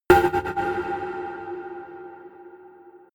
energized fuse; whooshes-rises; hits-impacts

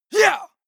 male combat attack short

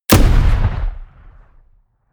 shot

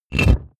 mechanical switch stone medium push

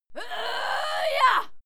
female fight scream yah struggle; schreie; menschen

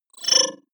disappear flicker; maschinen; signale; soundpool

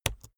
keyboard laptop enter press slow hard; kommunikation; computer

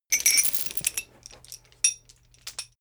metal chain pull up

